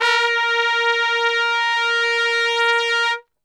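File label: A#2 trumpet straight, Brass; Best Service; Brass Super Section; TRP STRGHT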